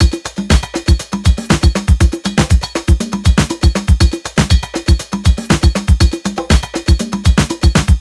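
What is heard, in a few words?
120 hard busy, Nu Jazz House Beats; PowerFX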